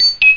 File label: bleep